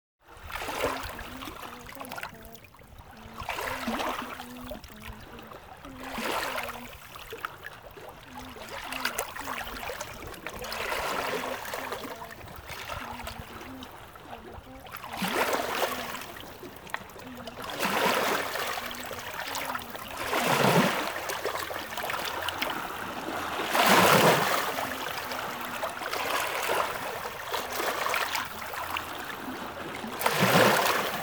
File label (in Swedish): Vättervågor